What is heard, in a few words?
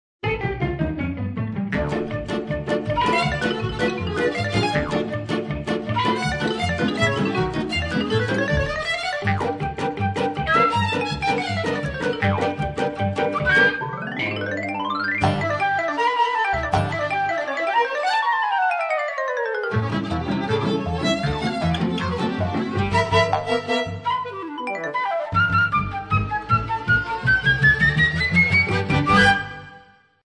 level 9; run